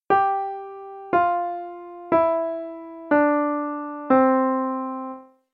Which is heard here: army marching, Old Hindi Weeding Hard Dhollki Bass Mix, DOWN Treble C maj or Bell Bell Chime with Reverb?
DOWN Treble C maj